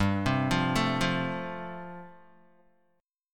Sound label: G 7th Suspended 2nd Suspended 4th, Guitar; G7sus2sus4 arpeggiated